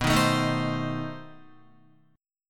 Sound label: B 7th Flat 9th B Guitar; strummed; JGuitar